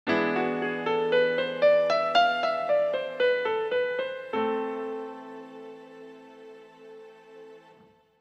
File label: E7 Am resolving